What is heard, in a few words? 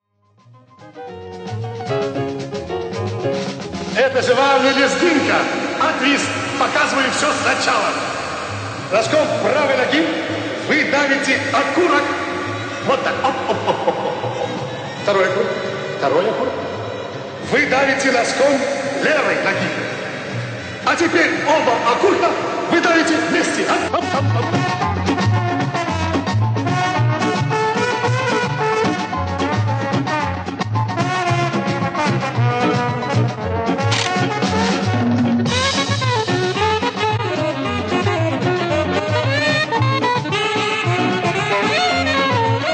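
ansambl i vokalnyiy kvartet, ensemble and vocal quartet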